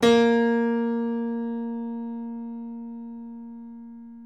nota singola di pianoforte

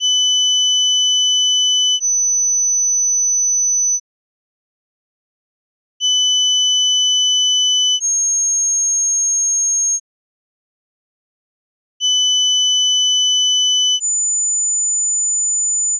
tre coppie di suoni di cui il primo è sempre 3000 Hz

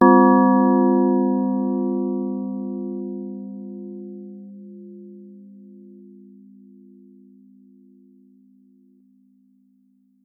suono elettronico tipo campana

